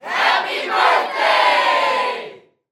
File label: Human Crowd Studio; Blastwave FX